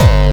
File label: Bassdrums Hard Kicks; Vengeance Samples